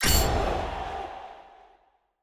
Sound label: countdown; gameplay